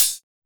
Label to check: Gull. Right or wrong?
Wrong